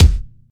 treble rich kick drum e key, Loudest frequency: 710Hz